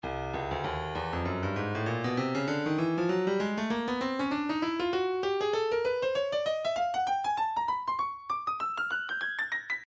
breakbeat 098 bpm; lift a; Stylus Breakbeat Midi Files

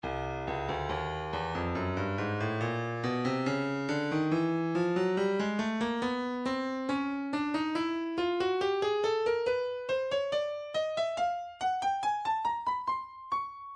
breakbeat midi files 070 074 bpm; intimate moment e; Stylus Breakbeat Midi Files

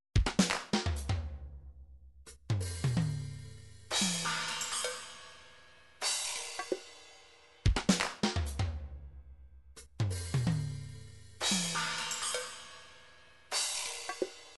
128 djembe, midi

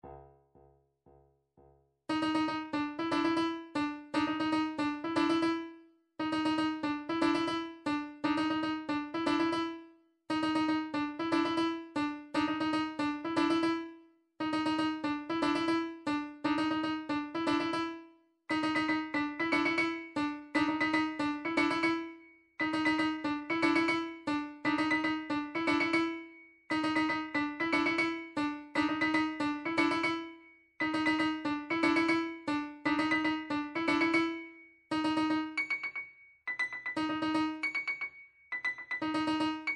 drum trax per

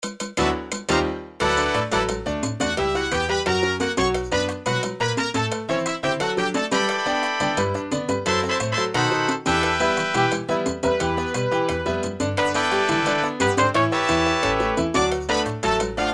salsa, midi

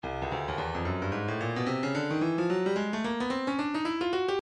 Percussion Bongos, midi; Stylus

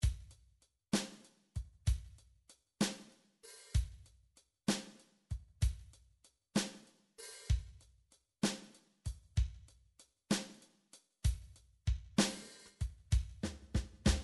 drum midi slow rok

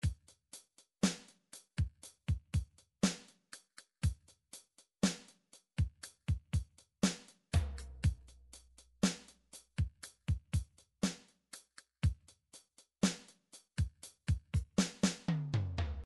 drums

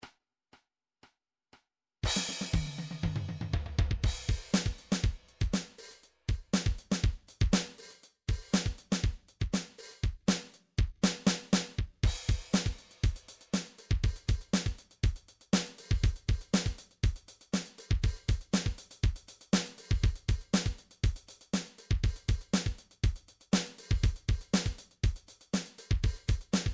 drum trax hard rock 120; Drumtrax Library 1

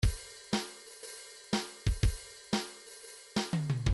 swing 2bar, midi

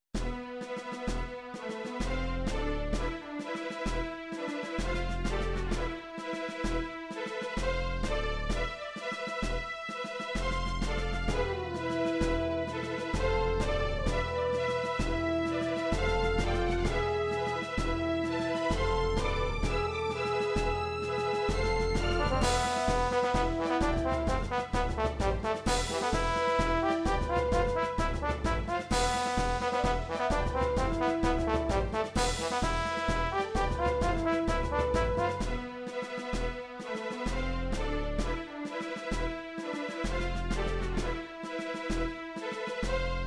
Roland sc 8850; super nintendo; sequenced; final fantasy mystic quest; focus tower

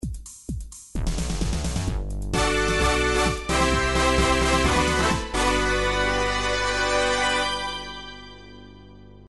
level clear; super nintendo super aleste

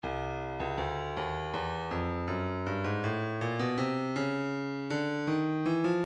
breakbeat midi files 080 084 bpm; modren g; Stylus Breakbeat Midi Files

